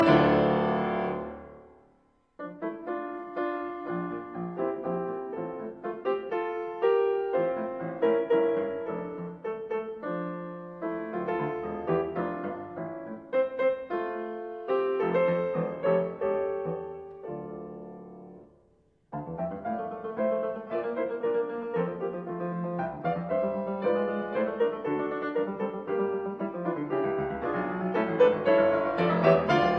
Variations on a Hungarian Song in D major; Op 21

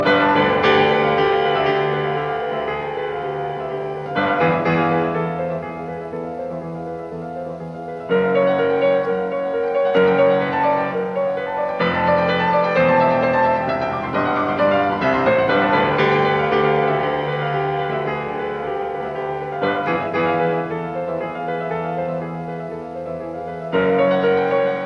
Variations in C major on a Waltz; 33 Variations; Op 120

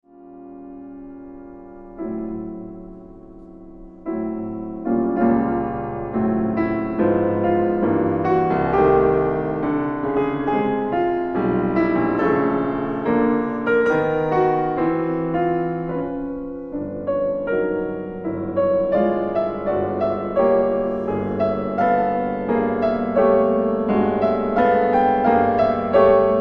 Preludes for piano Lento

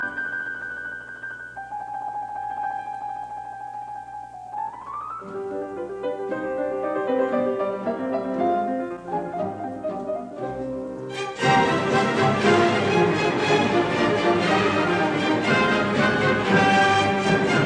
Piano Concerto in C minor Rondo Presto; Beethoven; Op 37

